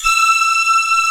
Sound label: LINEAR SYNTH